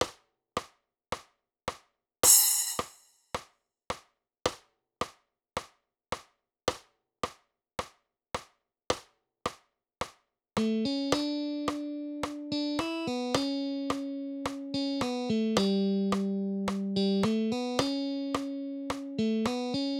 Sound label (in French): prelude, guitare